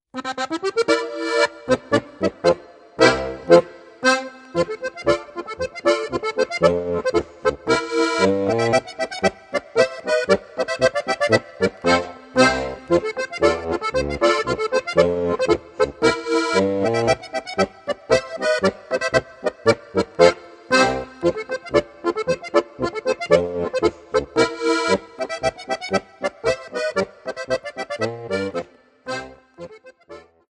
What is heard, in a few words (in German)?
polka, Steirischen